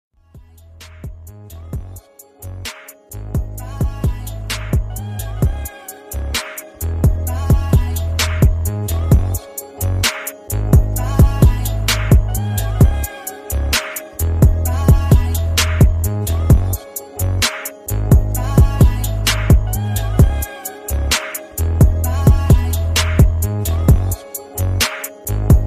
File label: Beat